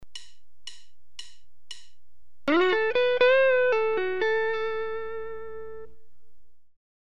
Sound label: Typical Box III lick, guitar